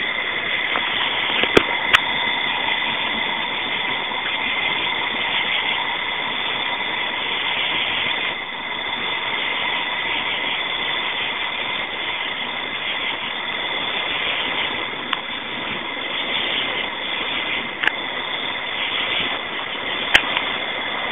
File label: Camping Sounds